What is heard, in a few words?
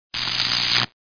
SPARK